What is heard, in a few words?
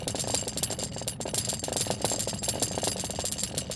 chain rattle